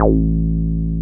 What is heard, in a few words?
303 RES A2M, RES BASS